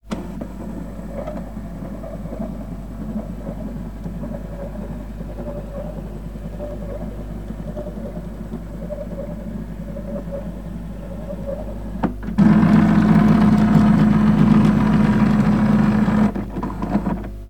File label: Flatbed Scanner